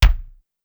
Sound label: Kick, Clavia DDrum AT, Pitched Down